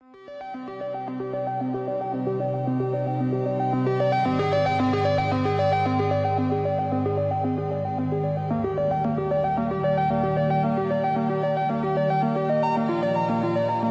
Electronic Impulse

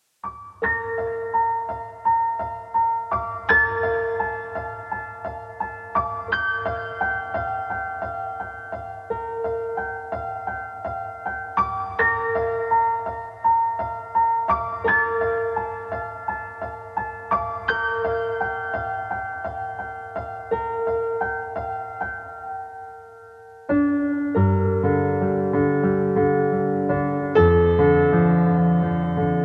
piano music 432 HZ

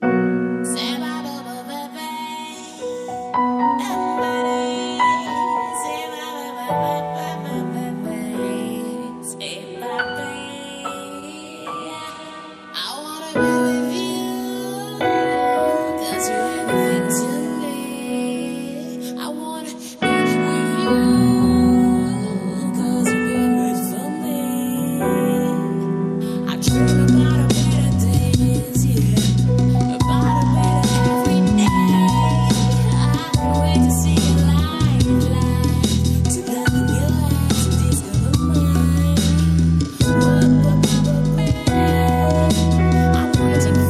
Chillout Funk Fusion

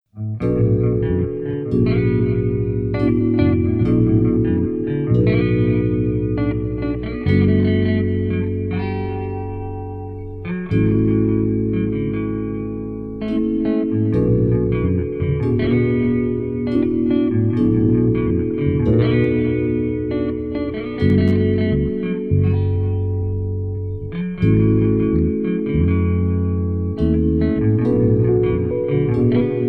guitar chill prelude 432 hz

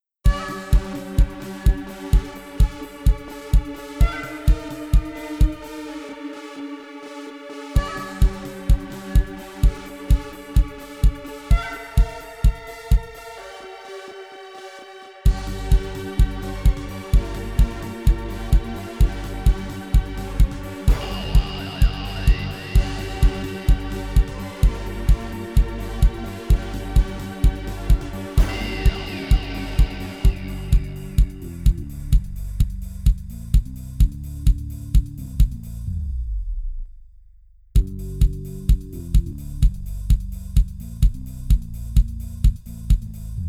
fitness music shoutin shakuhachi 432 hz; 128 BPM

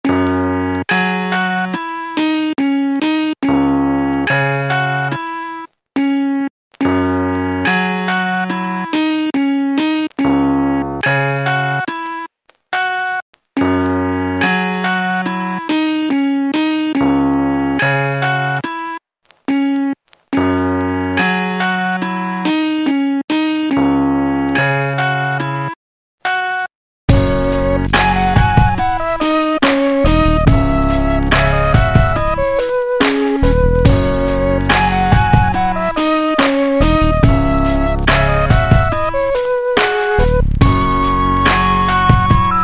chill beats 70; Hippie Harmony Tranquil Tunes

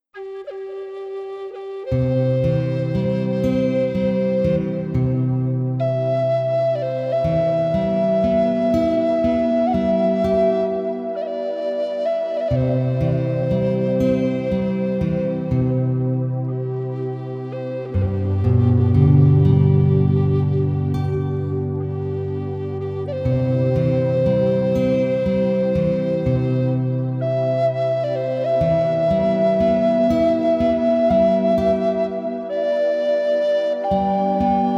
flute stories, 432 hz

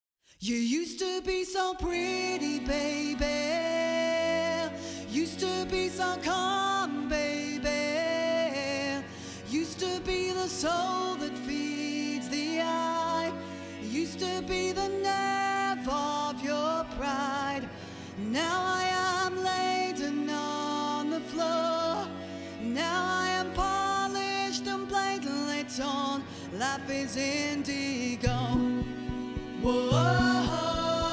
vocal chill, 432 hz

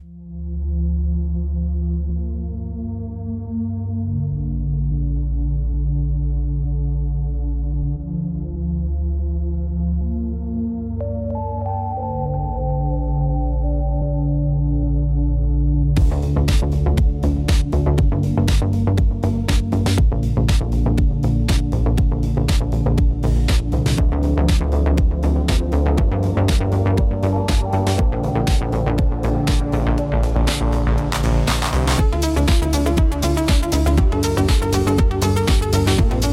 Electronic Impulse

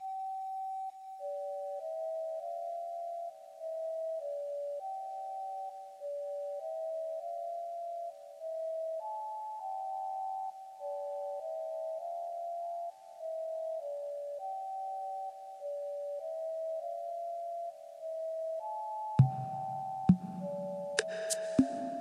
ambient meditation AMBIENT MELODY

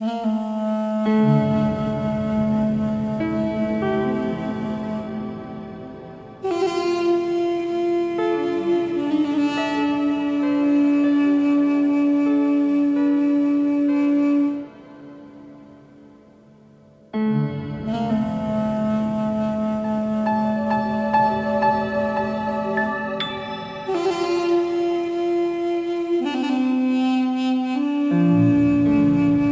duduk, 432 Hz

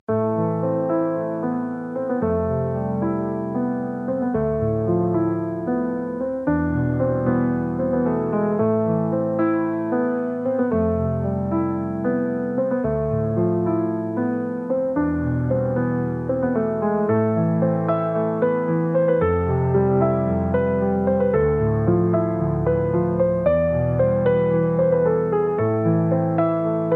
Asian Rhythms

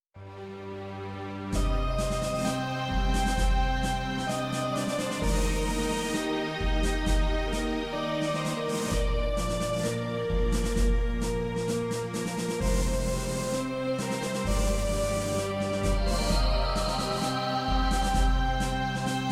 music dance

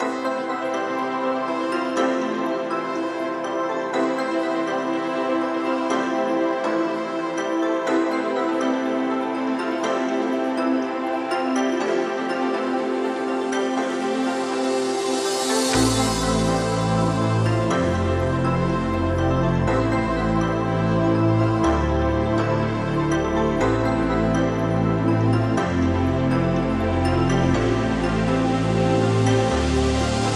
electro dance

